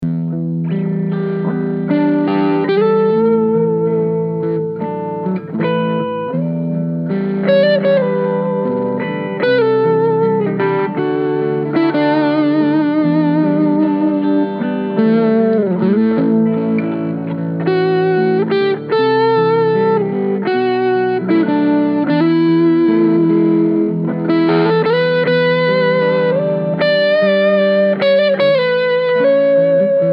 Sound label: Keeley Luna Overdrive jam, guitar